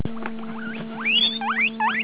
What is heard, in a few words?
squeak, Guinea Pigs, asking for lettuce; 16k sampled on Psion 3a hence buzz; black and brown guinea 'Abysinnian' guinea pig; Candy & Flossie; Hamble InfoZone; Flossie was a black and brown guinea 'Abysinnian' guinea pig